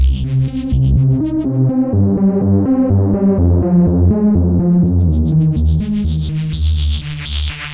radio C 124, synth loop; Synth Loops; Zero-G; Soundclash Nu Dance Electro; Acidized; 124bpm

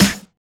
Snare; Zero-G; Nu Disco

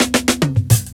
Fills, Roland; Sound & Vision - Gigapack I; Perc

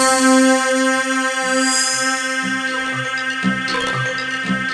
DIGITAL DANCE, ROLAND D-50; Rubber Chicken Software Sample Bank

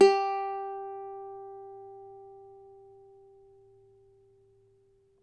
CAVAQUINHO G, Guitars

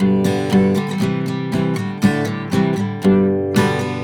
GTR6, Celtic Flavours; USB Soundscan vol.42; AKAI

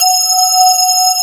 METAL BAZZ

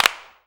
CLAPS, SINGLE CLAPS; Best Service; World Colours

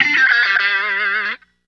Guitars; Total Drum&Bass; 90 s Sample CDs

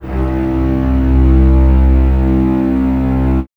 String Ensemble c0; TrashLibrary

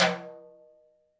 percussion, Roland TD-20

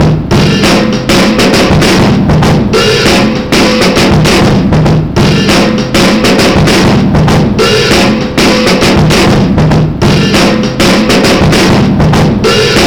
99 bpm; TALKBOY; 90 s Sample CDs; Planet of the Breaks

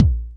BD, EMU Orbit-3; 300 Drum Machines

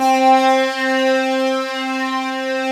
SWEEPER; VFX-SD #6

